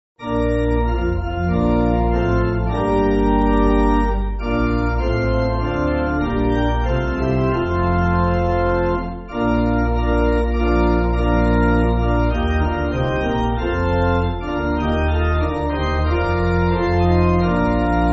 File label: clap pipe; All Nations Clap; Silver Street